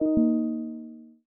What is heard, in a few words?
Alert Charging; Microsoft; Windows 10x